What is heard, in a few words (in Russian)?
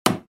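удар вонзание в дерево; Звук ножа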